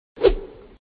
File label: bat; StickRPG Combat